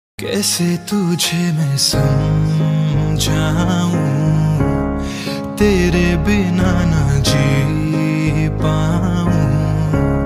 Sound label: Love Song Ringtone